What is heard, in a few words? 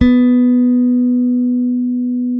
bass, sustain; Sampleheads; Will Lee Bass Library